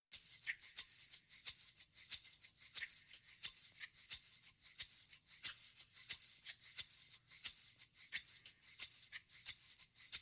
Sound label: perc only